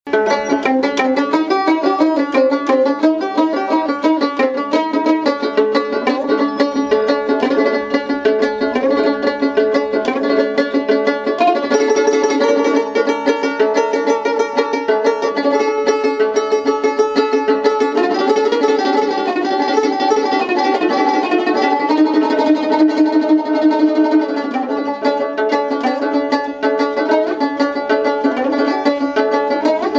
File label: Dotara Jamming